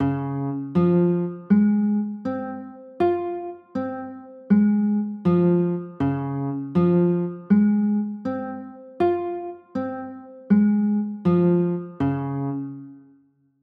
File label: c sharp maj, chords; c sharp maj7